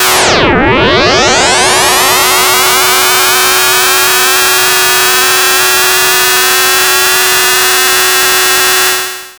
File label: FX SWEEP, SYNTHSWEEPS